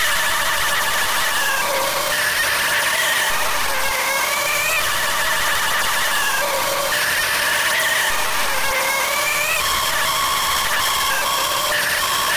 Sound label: drone brain frustration